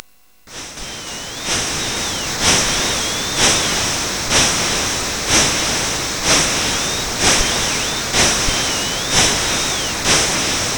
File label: Crowd noise, CSG8580R5; hvsc